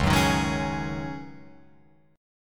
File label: Db Suspended 2nd Suspended 4th Db Guitar Open F; strummed; JGuitar